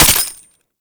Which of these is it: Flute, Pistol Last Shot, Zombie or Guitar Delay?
Pistol Last Shot